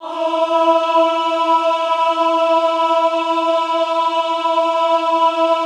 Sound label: AHH E3, Classical Choir; Best Service; Extended Classical Choir; AHH MIX